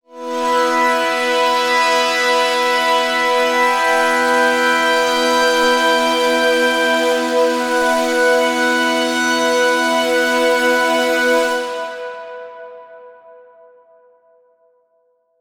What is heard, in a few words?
Jungle Pad; Imaginando FRMS